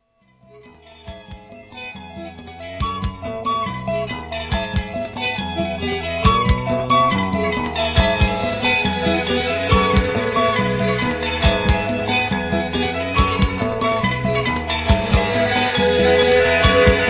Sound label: guitars; ファンカラティーナ系ギターポップ